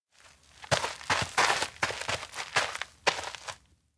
steps outdoor ground search; awayuki; anemoi

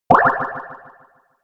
head drop; anemoi

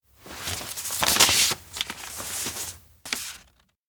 letter open slow; anemoi

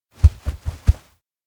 head shake; anemoi

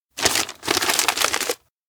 paper roll ready; anemoi

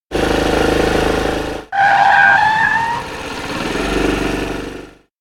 sudden uturn; anemoi

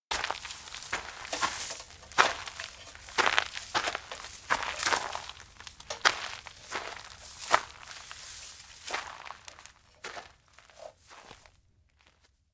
steps outdoor forest walk slow; anemoi

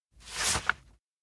map compare flip; anemoi パッケージ版

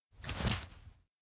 zipper grab; anemoi

